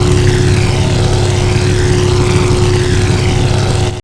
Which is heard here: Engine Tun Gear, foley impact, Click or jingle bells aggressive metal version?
Engine Tun Gear